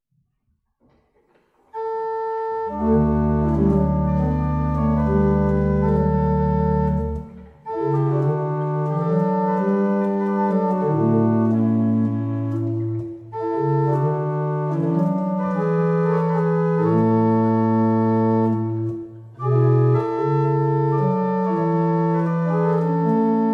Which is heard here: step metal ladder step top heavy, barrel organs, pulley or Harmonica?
barrel organs